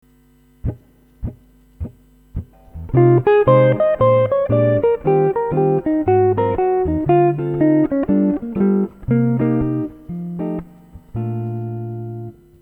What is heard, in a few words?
Rhythm Changes Licks Etude, guitar